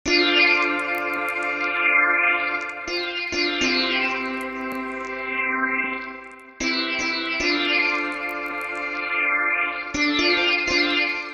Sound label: sidsonic tubes lead